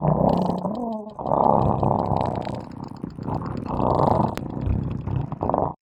MUDRAPTOR pet Happy

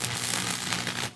Stun Baton

